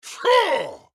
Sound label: Attack Large; Black Adam